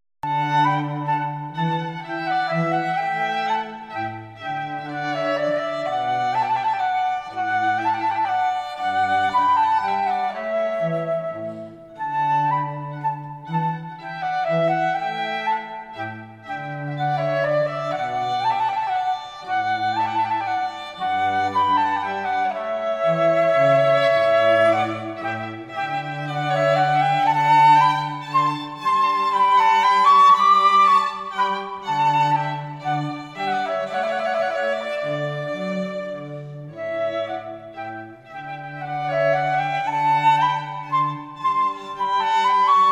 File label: Trill Sonata, Menuetto; Tartini, Menuetto and Allegro from "Trill" Sonata in D Major - Violin, Flute & Cello